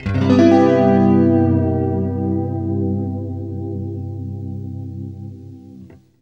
FLESH CHORDS, Guitar

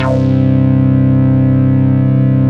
SYN, analog

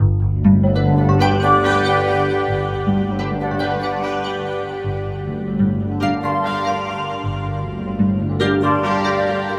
Keyboard

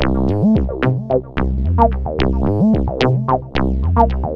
303 loops; Sonic Foundry (Sony Creative Software); Ugly Remnants VOL-2

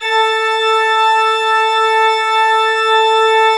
A3, Cathedral Organ; ILIO; Propeller Islands Cathedral Organ

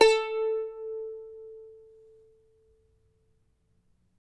CHARANGO

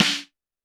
Snare, Roland TD-20

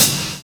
CRASH CRO; Univers Sons - Kitch 70s

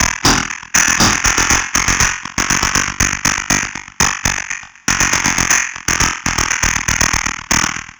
Structured Rhythm, IDM Rhythms

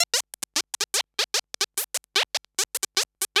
Droids

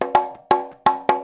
FILL, Percussion

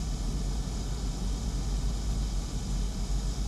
Ambience Loop, outdoor

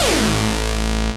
technosyn2, Techno Trance; Ueberschall; SYNTH

